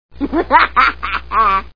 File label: laughs; bart; Simpsons